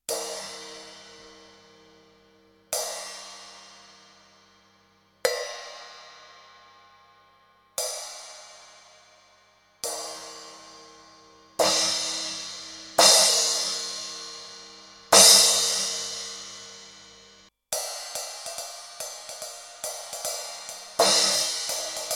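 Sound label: crash PAISTE 2002 14, CYMBALES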